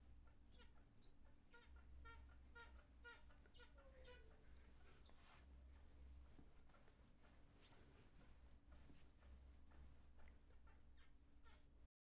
Squeaking Bed

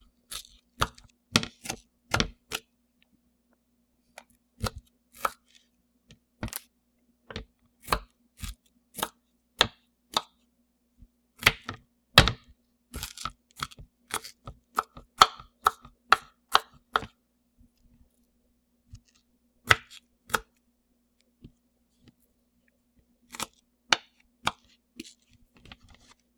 Onion cutting on poly board

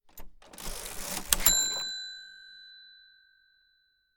Open

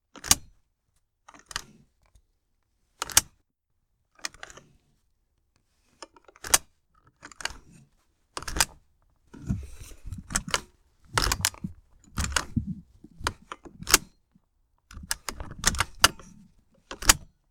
Clicking Seatbelt

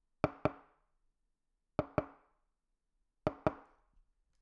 Knocking On Wood

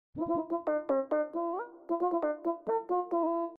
Yiddish synth

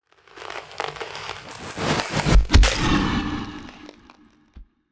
Hard Whoosh Into Hit Debris

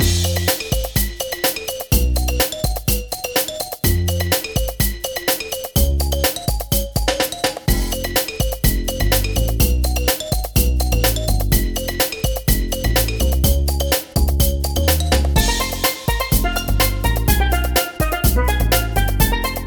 Cartoon Game Theme Loop

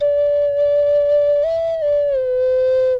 Ocarina Loop

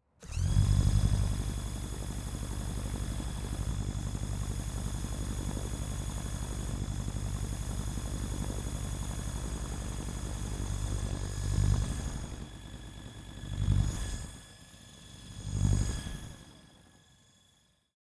Remote Controlled Helicopter

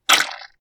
Squash

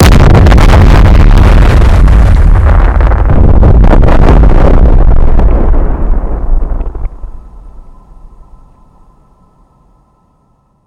Crispy Nuclear Bomb Sound Cartoon

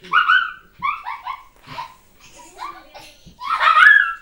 Child Laughing

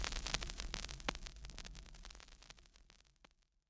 Fire Crackling

